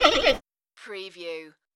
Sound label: creature talk